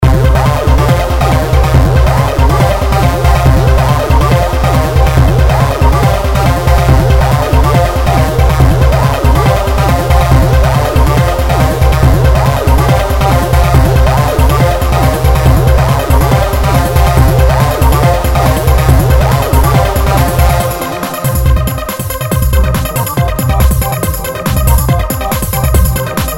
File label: bizarre song